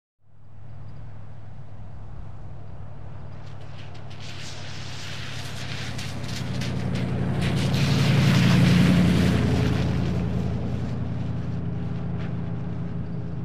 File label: Car Exterior - Medium & Wet - By And Away; wet traffic